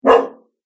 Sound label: Dog Rough Bark; Dog Sound Effects; animal sound effects